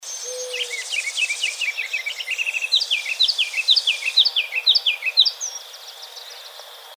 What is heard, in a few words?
Ruby crowned Kinglet Corthylio calendula; BC